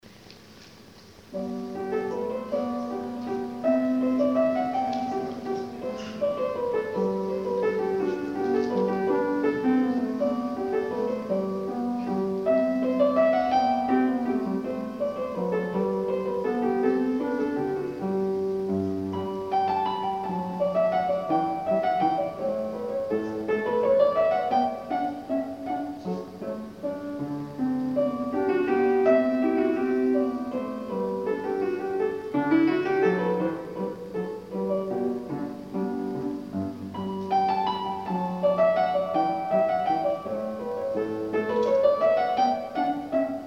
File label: Menuet BWV 114